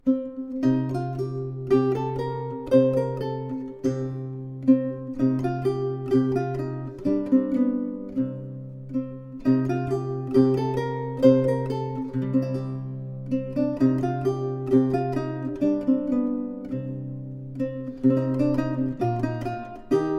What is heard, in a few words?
Canson Englesa, lute; Lusty Gallant